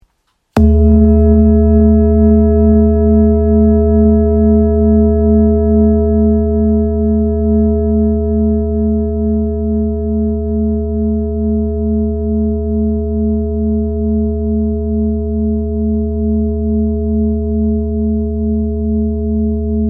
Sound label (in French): Bol chantant therapeute 2.08kg la